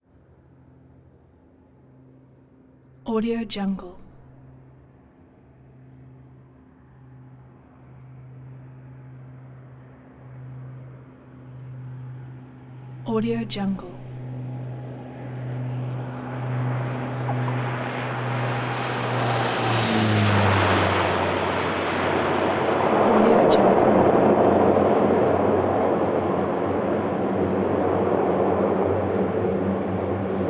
turbo propeller plane mitsubishi mu2j approach

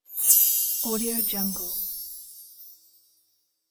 diamond sparkle; sound create

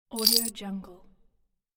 coins

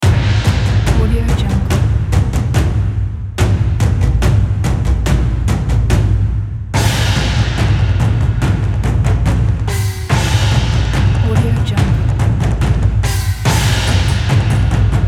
chinese new year percussion

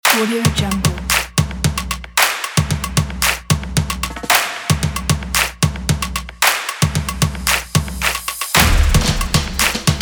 drums

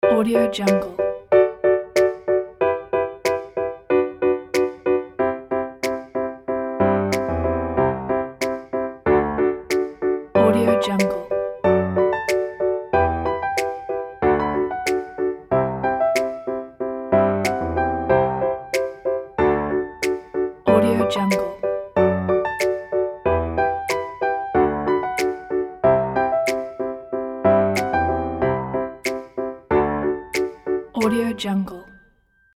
funny animals

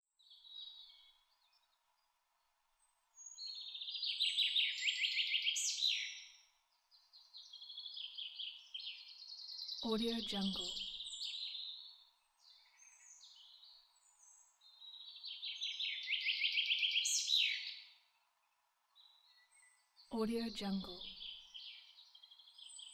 nature

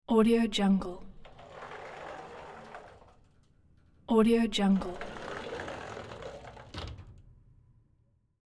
glass sliding door open and close

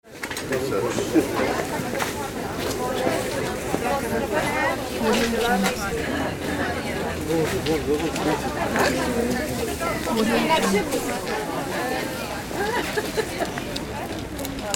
street market ambience

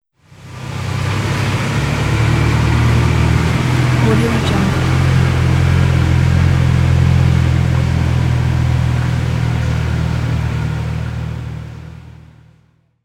car passing; soundfood

